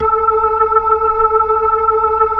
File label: B-3